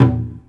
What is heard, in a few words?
Taiko LO, Percussion; MARKYDRUMS